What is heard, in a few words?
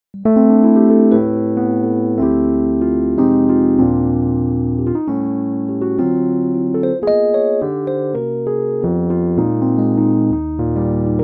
mk2; native instruments; electrik piano